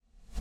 Photo General Focus-Up Rev

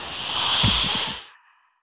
Screamer Death

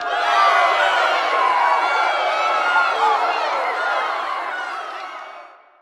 Crowd; Josh Journey Darkness Totems

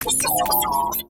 Ground Energy Warning Sound

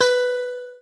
Clock; Mars Sortie

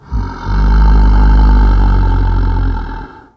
Orc breath

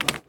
stone placing

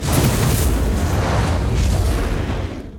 Finalboss Wave fly

